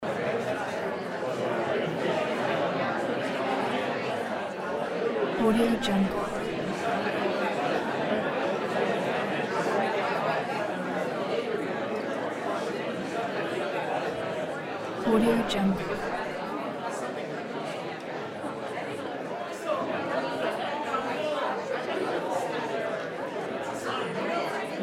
walla or crowd murmur loop

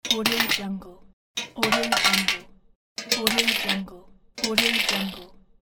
inserting coin into machine; n-audio

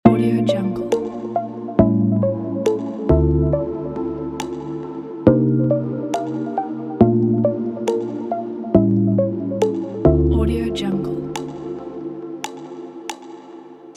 soul lounge relaxing rnb

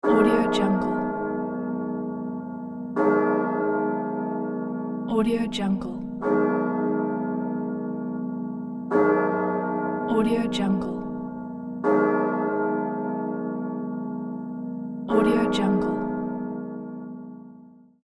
church bells; fresh sounds

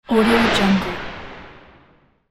eerie transition

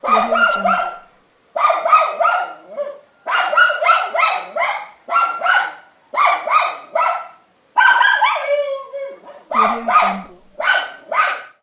dog

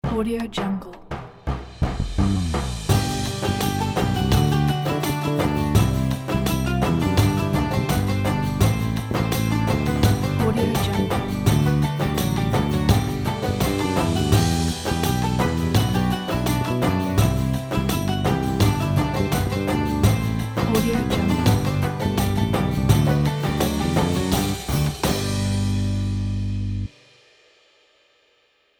happy ramadan ident

